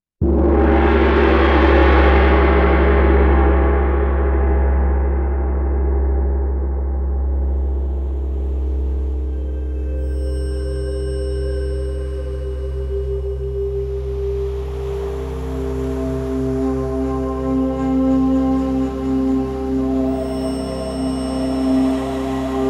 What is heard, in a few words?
528 Hz Angel Meditation